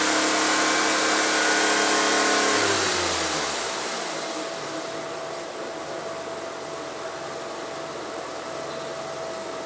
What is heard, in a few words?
smart roadster coupe brabus